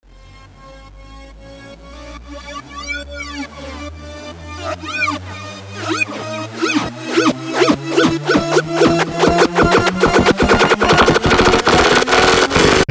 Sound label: Goofy Dubstep Intro